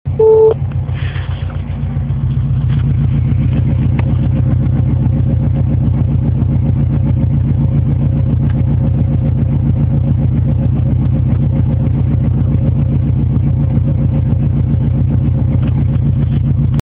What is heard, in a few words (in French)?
Ralenti SUB Inox OMP, 106 XSi